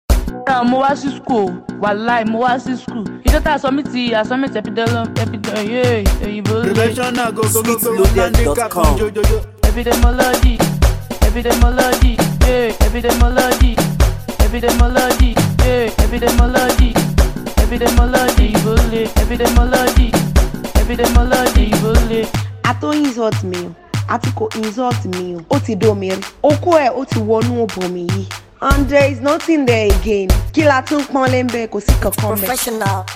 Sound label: Professional Beat, Ileke Beat